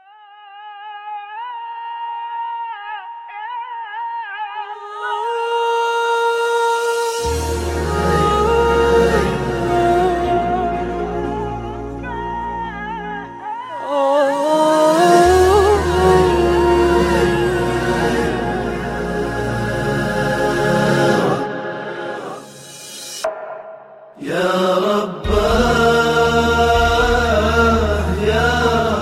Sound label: iPhone 6, Islamic Ringtone